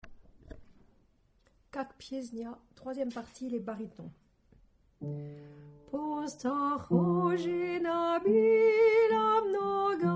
Baryton

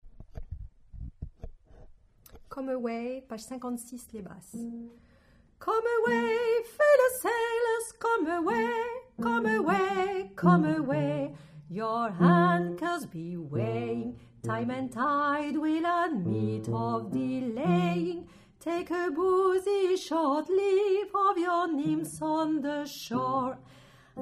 Basse; choeur des marins

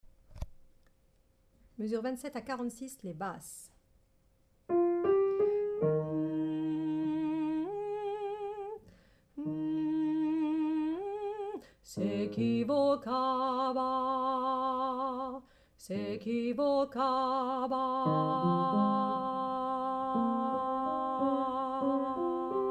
paloma Basse

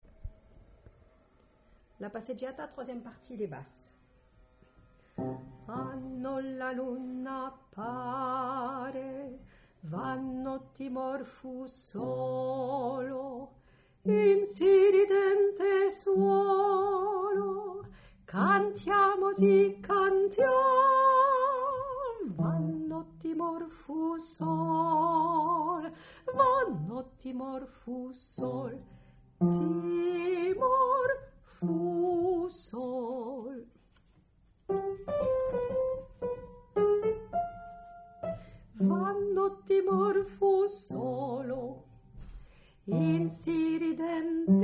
Basse

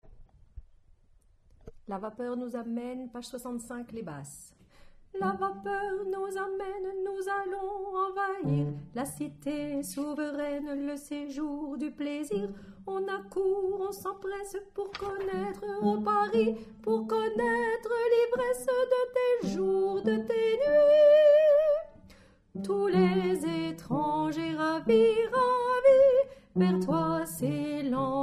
Basse

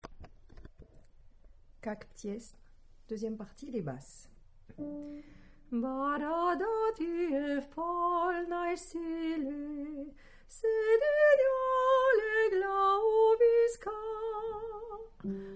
Basse

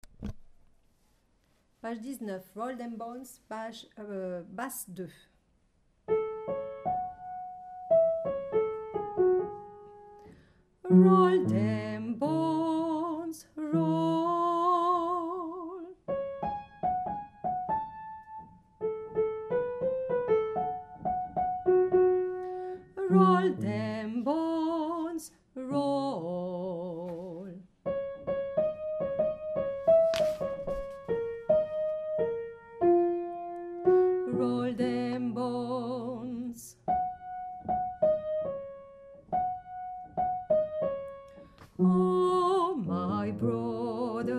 Basse

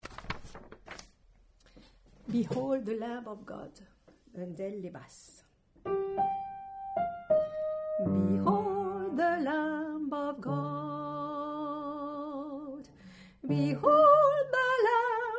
Basse; behold

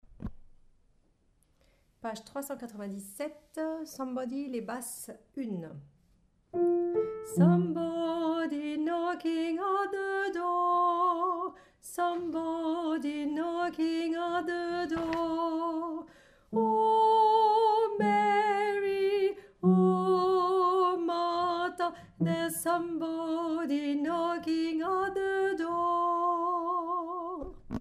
Basse

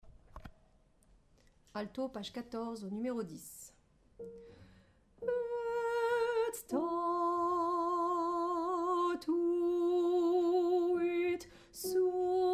psaume Alto

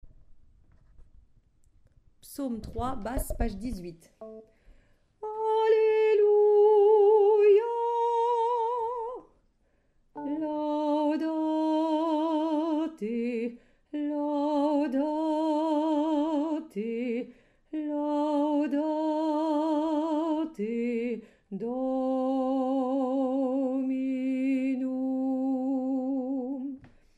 psaume Basse

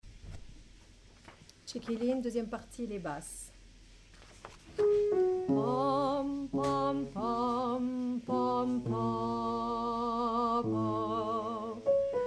Basse

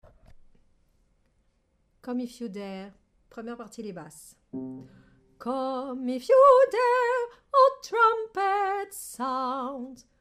Basse

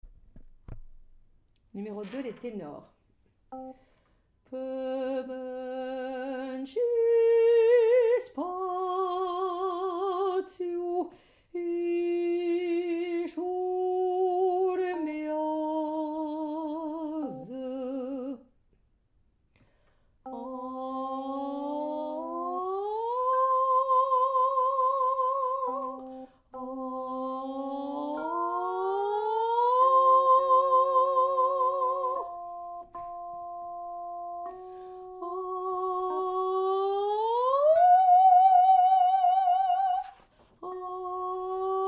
Tenor; pop

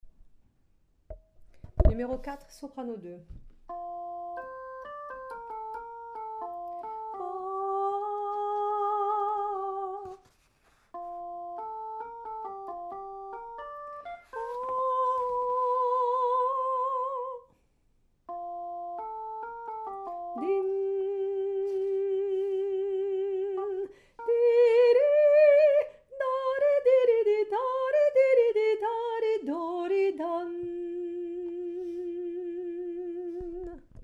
Soprano; pop

